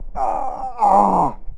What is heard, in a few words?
ouch